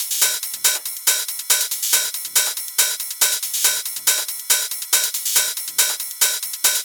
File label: Loop 140 BPM; vengeance essential club sounds-3